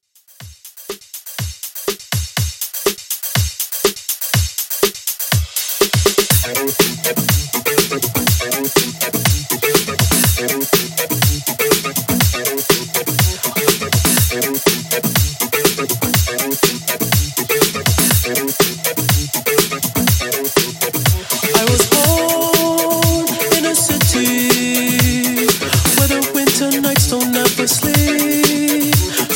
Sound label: RnB Redrum 122Bpm